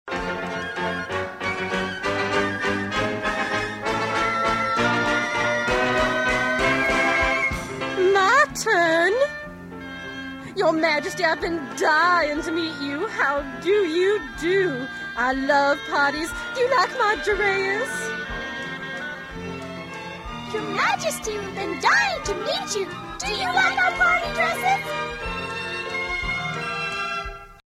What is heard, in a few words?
Waltz